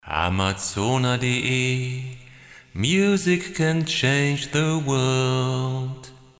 tc helicon perform ve sampler

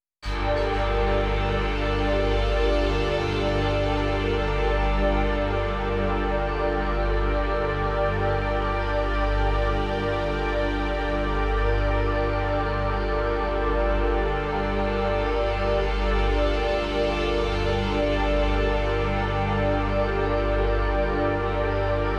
Pad Dry

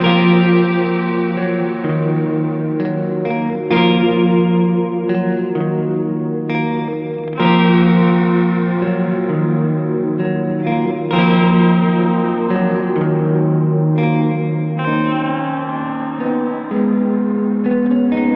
Symmetry Reverb; Black Hole

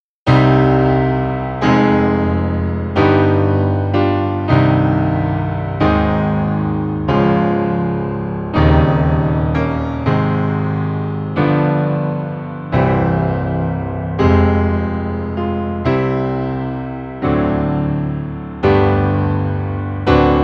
Nord Grand White Grand; Chopin Prelude

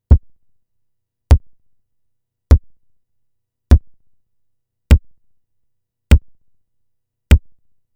SDS 1000 Kicks

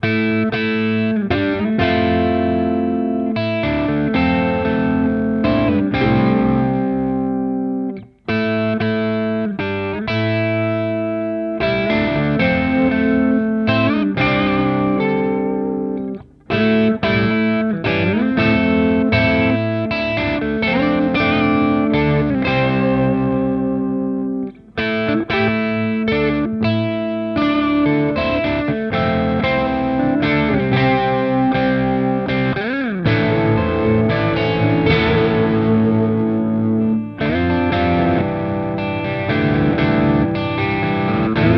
Pro Mod Crunch Both; Charvel Joe Duplantier

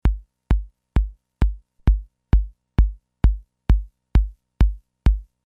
Kick; SoundmaxxII

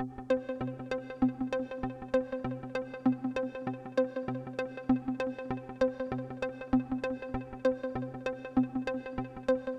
Roland Juno-106

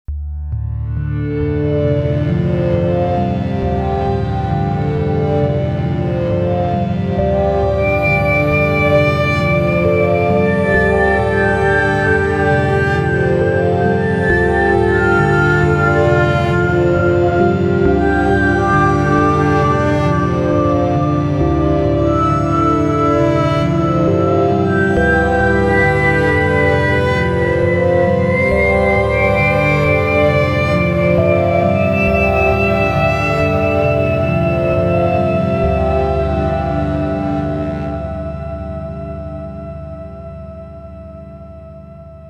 Elektron Digitone pad; Favpad

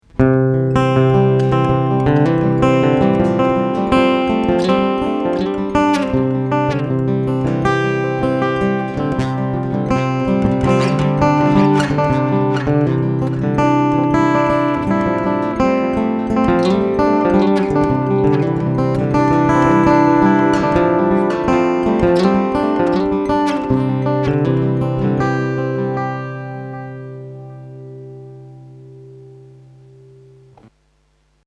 delay; tech21

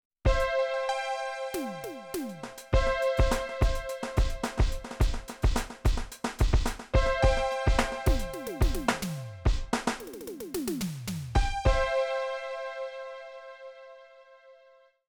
Millenium MPS450 FX Mix

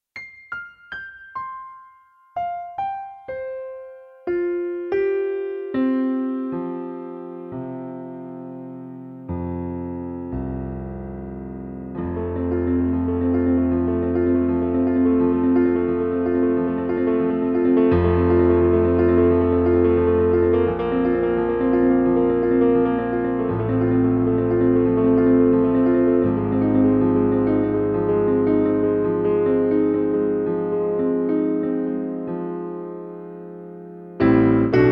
Piano

